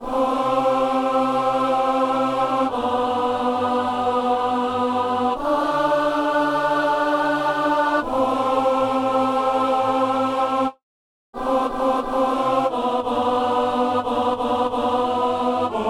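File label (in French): ténor; Messe de Saint-François-Xavier - Agnus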